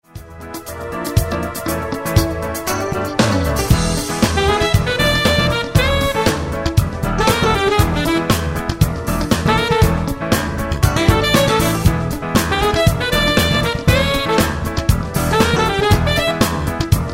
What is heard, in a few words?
Jazz Fusion